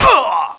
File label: die; OutLaws